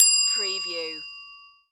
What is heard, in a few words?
Desk Bell Ring